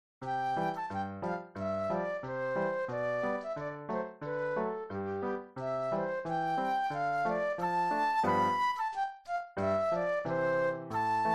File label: Flute Solo; classical